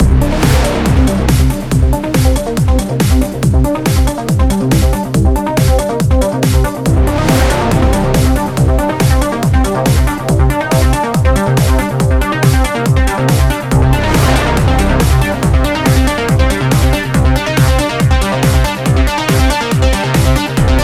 test synth1 goa

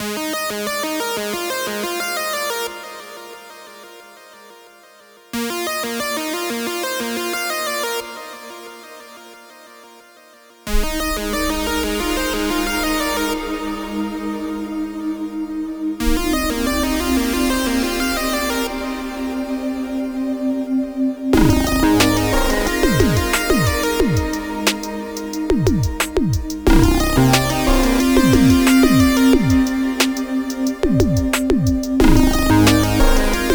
lent lead

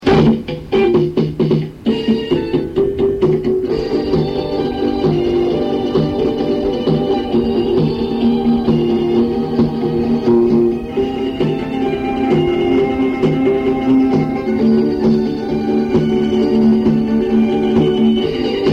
rock yamaha

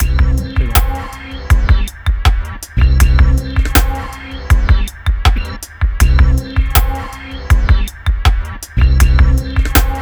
hiphop

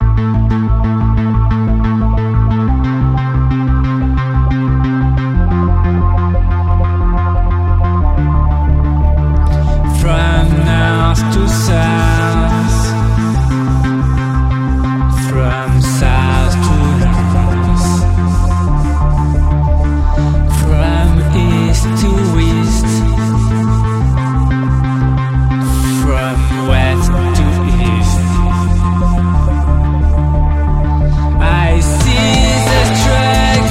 trip rock slow

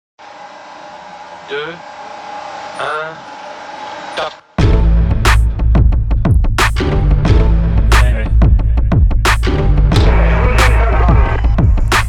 rap

